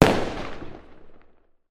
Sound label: Small Medium Asteroid Destroyed; Asteroids; Small and Medium Asteroid Explosion